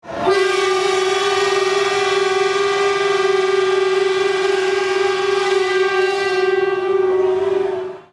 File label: klaxon